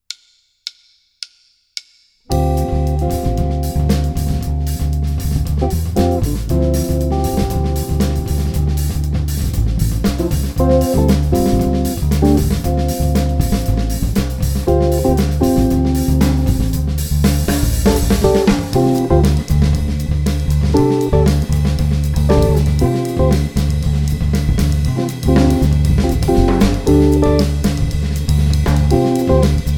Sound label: Vamp